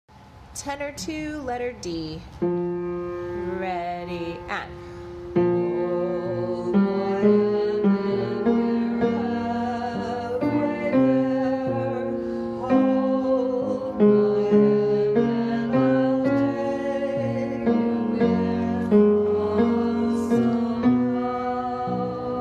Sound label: tenor 2